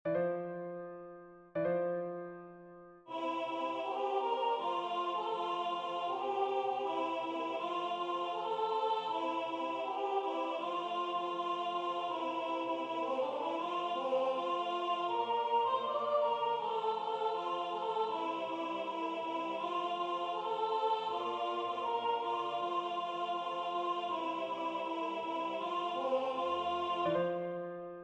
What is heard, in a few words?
alto